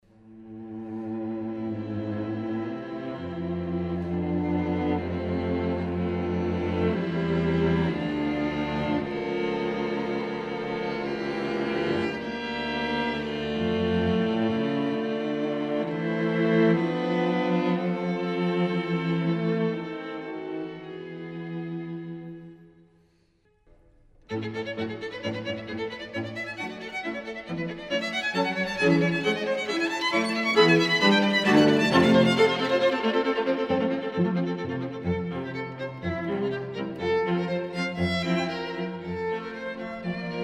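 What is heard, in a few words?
string trio; fuchs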